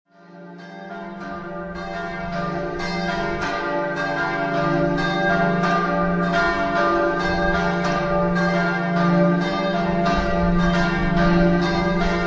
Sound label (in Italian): CAMPOROSSO, campane